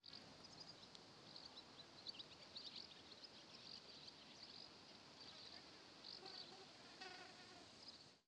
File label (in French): Friche Industrielle insectes Et Pépillement; Rhinau